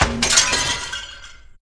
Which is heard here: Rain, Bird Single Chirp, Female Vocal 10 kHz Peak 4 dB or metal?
metal